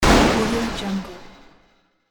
big metal bang

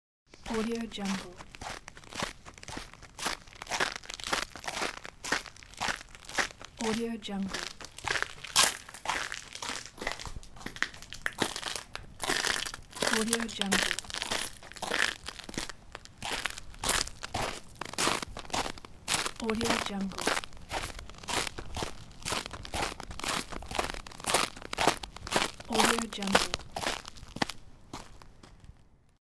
walking on ice and snow

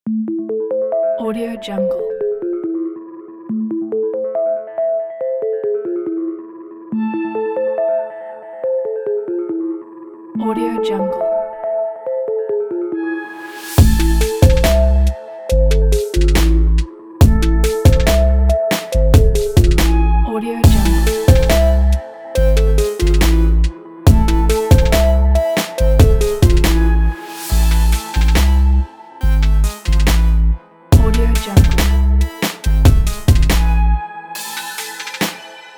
hip hop track

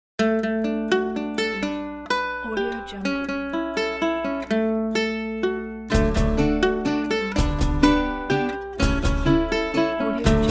mexican fiesta

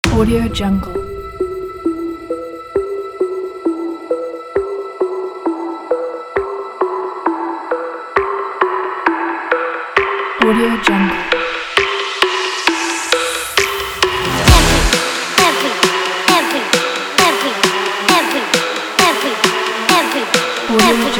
agressive pluck trap

